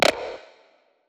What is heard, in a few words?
perc burp; Drum Sample Pack; One Shots; Slate; SEISMIC